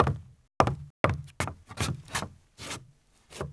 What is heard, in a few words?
steps; Mafie 1 cz